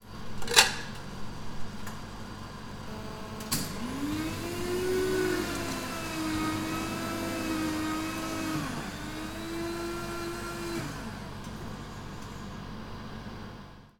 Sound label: Coffee Vending Machine